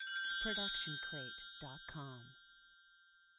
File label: Bells Ident; SoundsCrate